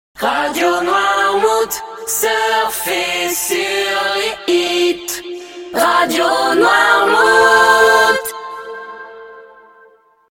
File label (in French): Jingle Chante Soft Pop accap; RADIO NOIRMOUT